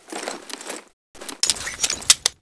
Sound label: bazooka reload, weapons; dod 1.3; dod enhancement pack